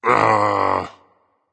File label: seriously berserk; cuchulainn